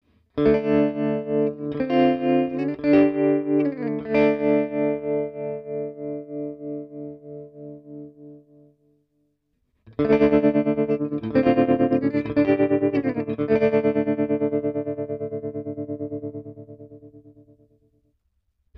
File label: tremolo